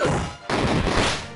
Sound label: Attack, Hammer; Hela's Hammer; DQIV iOS SFX